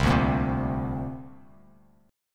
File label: C 7th Suspended 2nd, C, down; C7sus2 strummed; JGuitar